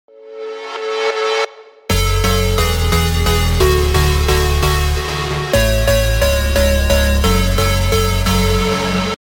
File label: Coolest Sms Tone